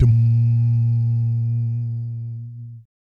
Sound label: BASS DUMS; Spectrasonics; Vocal Planet; Jazz FX